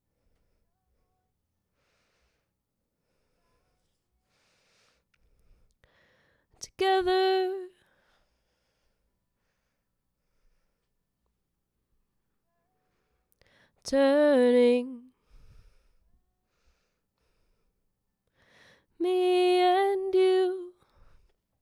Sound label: vox harmony